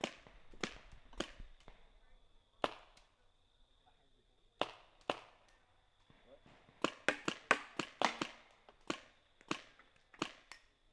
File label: paintball hits misc, splats